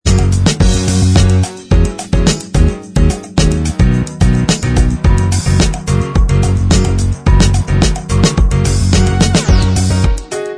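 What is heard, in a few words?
instrumental, backing tracks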